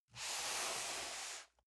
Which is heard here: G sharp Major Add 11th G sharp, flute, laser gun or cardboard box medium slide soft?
cardboard box medium slide soft